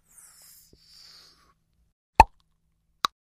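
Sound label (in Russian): надувание пузыря и поп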